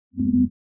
mid space ship short fly by; soundscrate

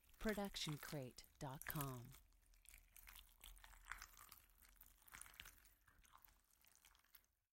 squishy long; soundscrate

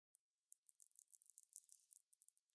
TV Screen Static; SoundsCrate

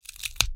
Bone Crack; SoundsCrate

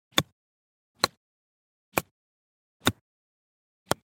golf club pitching wedge stereo

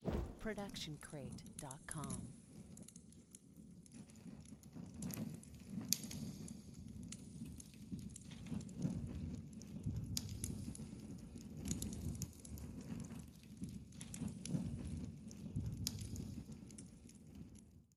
Torch Light Burning In Tunnel; SoundsCrate

